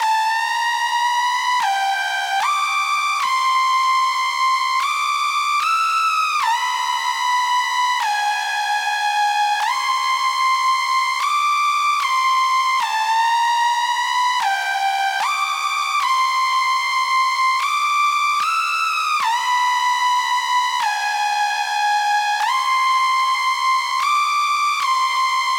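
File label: 150 BPM E Minor Lead, Future Bass; Cymatics; GHOST (Beta Pack); Destination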